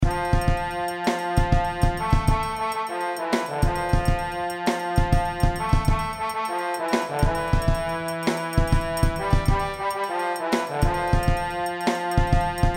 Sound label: Trombone